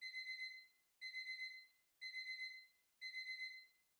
Kitchen Timer (Far-Alarm-Loop)

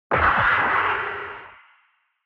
Retro Anime Robot Low

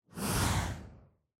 Camera Motion Effect

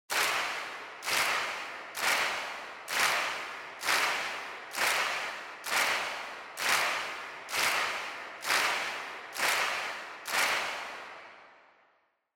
Handclap Fast

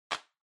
Calculator (Hand Tilt)

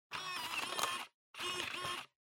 Optical Disk Drive Insert

